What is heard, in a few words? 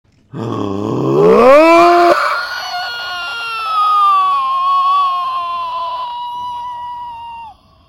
raven screech